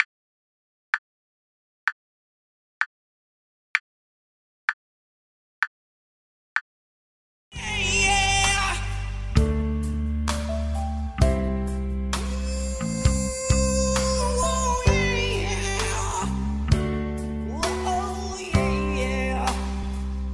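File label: STANDARD TUNING backing track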